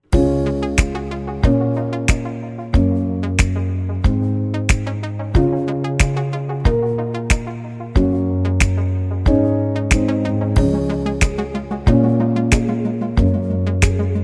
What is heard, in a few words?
rock pop